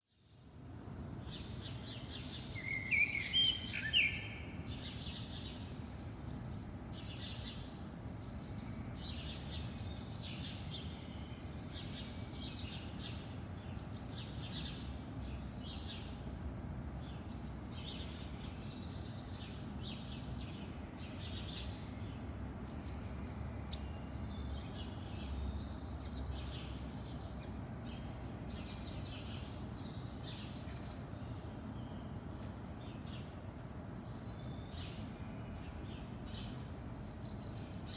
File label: birds